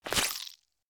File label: jump slippery; fallguys